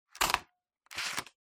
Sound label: Hole Punch Punch